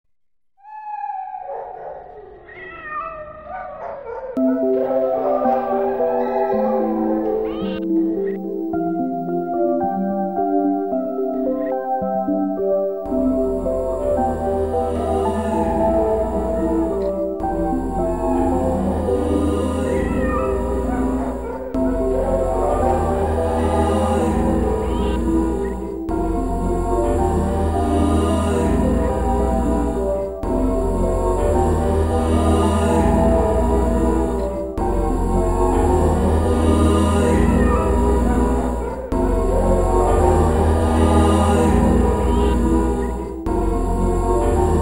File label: music